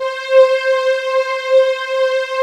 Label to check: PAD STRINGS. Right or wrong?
Right